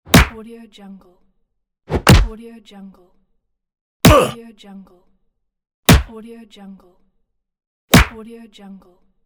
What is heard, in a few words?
punch; مشت زدن; human sounds